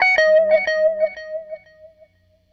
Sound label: Strat Guitar Loop